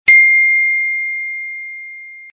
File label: iphone ding, suoneria